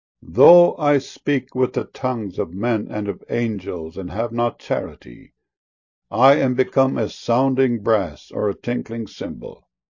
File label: cymbal